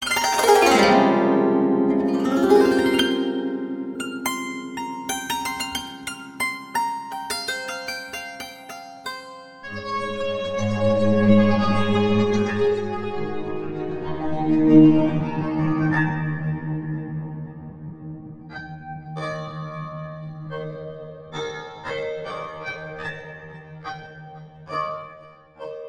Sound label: Koto Gliss Pair; eShop